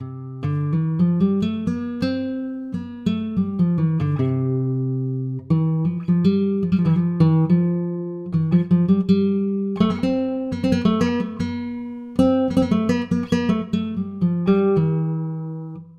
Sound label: maqam rast